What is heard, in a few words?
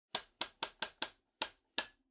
Electric Fan Height